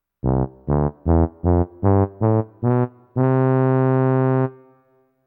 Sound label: tuba short